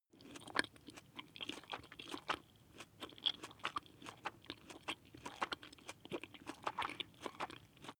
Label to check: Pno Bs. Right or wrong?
Wrong